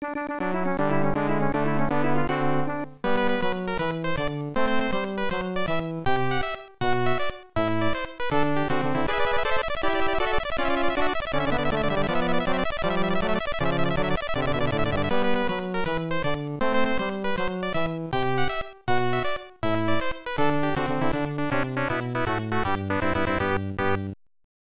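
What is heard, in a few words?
Game Set (Lose), baseball; Pro Yakyuu Family Stadium Pennant Race; MSX2; MSX-Music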